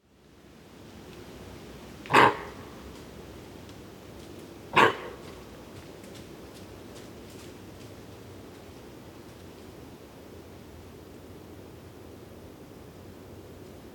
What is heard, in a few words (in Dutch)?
blaffend hert